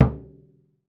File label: SURDO MUTE, Latin Drums; Latin Drums & Rain